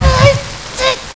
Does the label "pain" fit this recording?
Yes